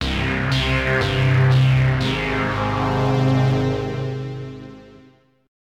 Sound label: GAME OVER